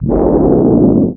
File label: bounce